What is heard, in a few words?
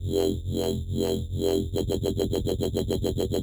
BASS SHOT Wobby